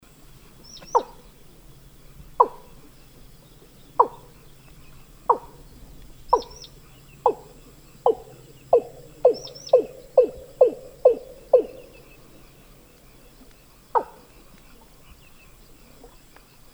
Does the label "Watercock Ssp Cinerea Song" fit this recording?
Yes